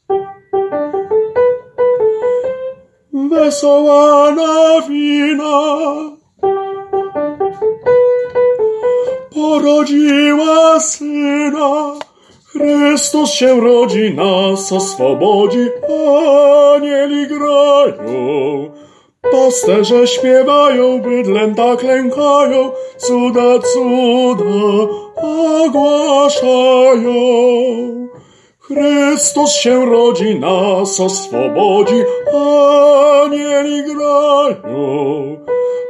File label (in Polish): TENOR; Dzisiaj w Betlejem